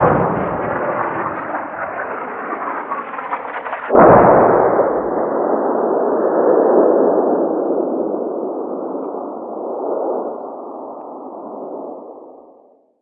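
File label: artillery medium; Call of Duty Modern Warfare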